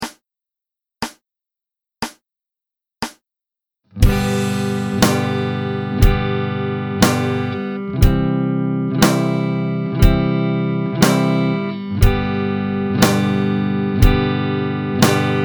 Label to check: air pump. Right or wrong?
Wrong